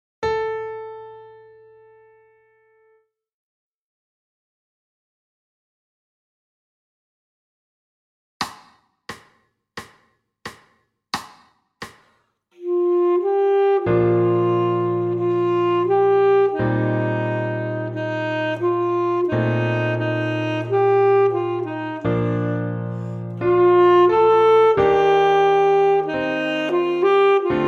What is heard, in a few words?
Sax